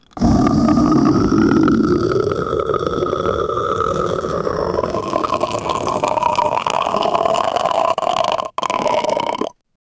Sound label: latex growl